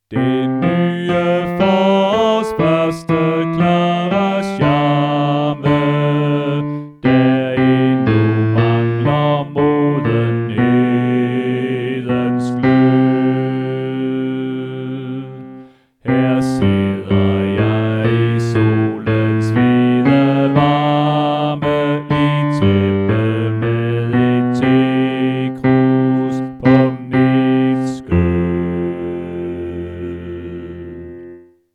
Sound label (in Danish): Bas; oevefiler